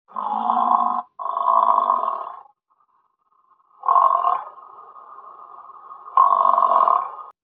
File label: bizons